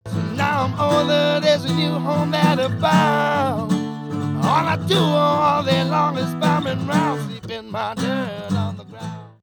left guitar reference vocals reference right guitar reference; scene quality